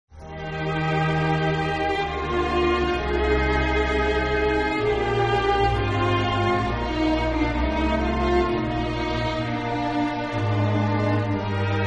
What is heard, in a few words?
learning music